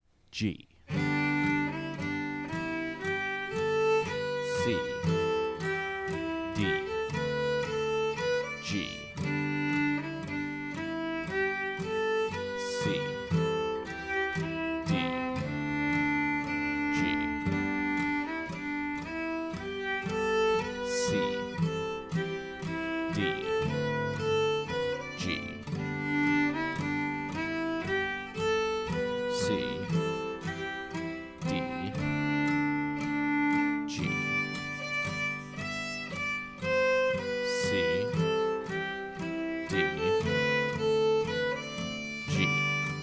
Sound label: wedding slow with chord cues